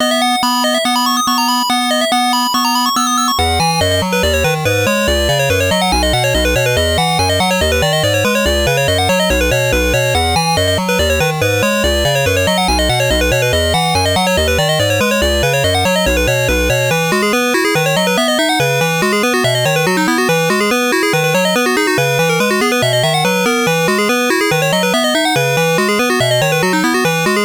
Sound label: basic wave